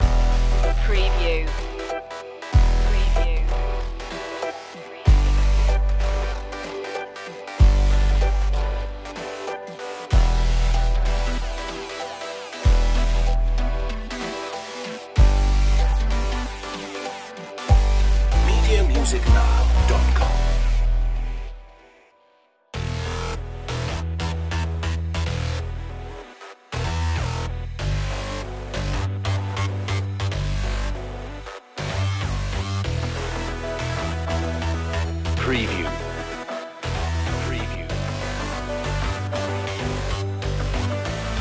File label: rude guy no drums